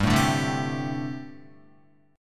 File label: G Suspended 2nd Suspended 4th, Guitar; Gsus2sus4 strummed; JGuitar